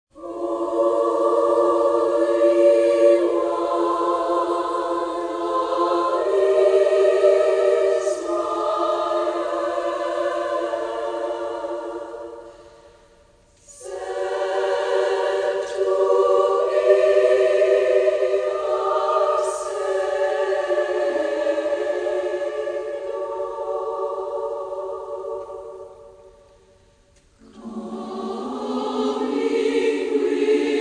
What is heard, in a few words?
worship hymn